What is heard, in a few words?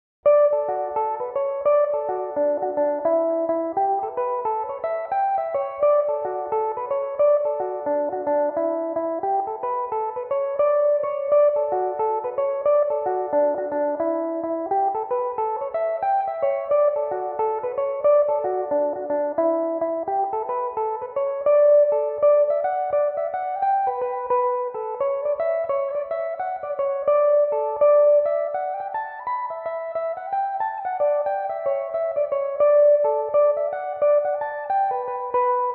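D major, mandolin; lough allen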